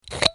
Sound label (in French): Decapsulage; bruitage; Liquides